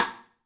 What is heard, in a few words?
MARFSN, 3D Drums, X STICK; 3D Sound